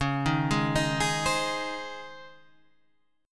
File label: C sharp Major 7th Suspended 2nd C sharp, Guitar Standard; arpeggiated; chord; 9,6,6,8,9,8